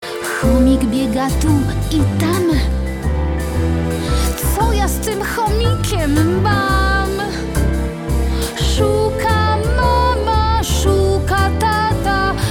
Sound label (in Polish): Domowe zwierzaki wokal